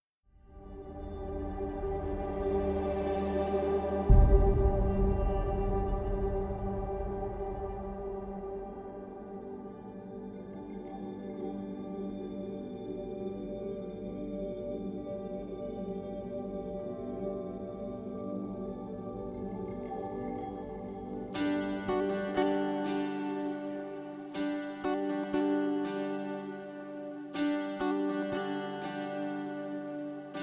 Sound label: Rebirth Bgm Tamil Ringtone